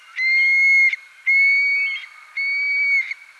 call, Stone curlew, This is the "long" variant of simple whistle; recorded on breeding ground; Burhinus oedicnemus